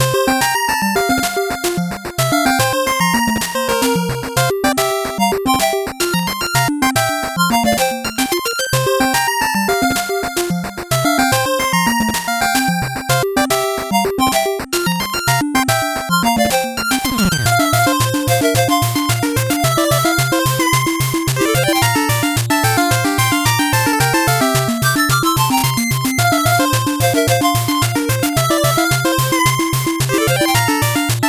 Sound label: 8bit bgm slow